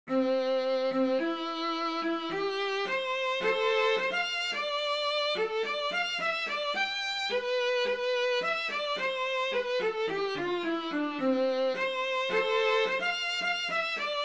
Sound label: Spring Hummingbirds MELODY, fiddle